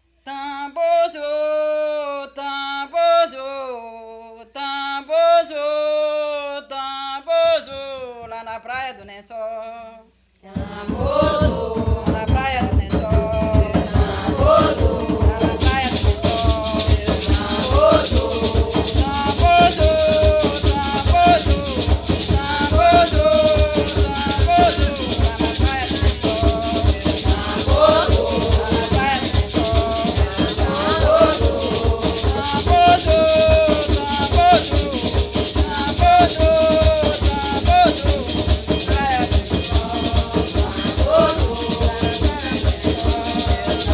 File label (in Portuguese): tambor